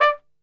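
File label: D 3, flugelhorn; Best Service; Brass Super Section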